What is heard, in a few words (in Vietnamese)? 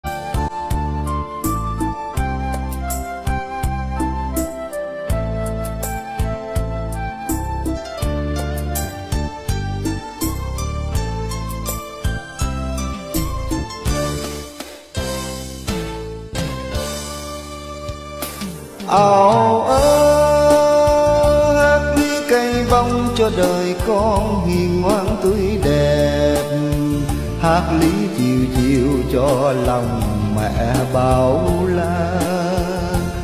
LK Ca Vọng Cổ; Rơi Nước Mắt; Nhạc Chuông